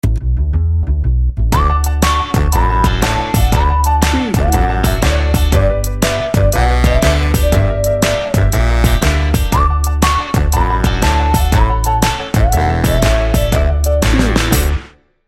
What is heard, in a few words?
upbeat cheerful 50s pop